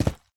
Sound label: Steps Dirt